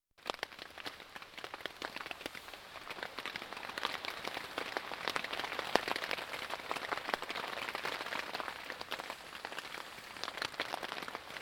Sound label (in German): GEWITTER; CHIEMGAU 2003